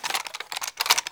rifle jingle; Rust

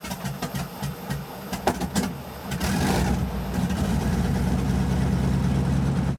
start engine, engines; World of Tanks

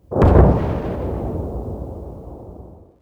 distant explosion

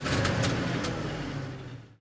engines; World of Tanks

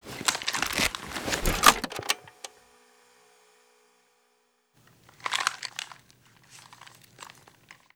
music; Call of Duty Modern Warfare

